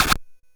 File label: Drums, Hits; DCM8